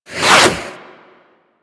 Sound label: Boomerang Whoosh